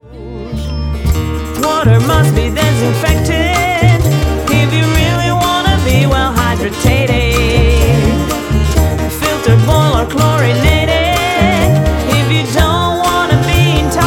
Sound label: Water Paani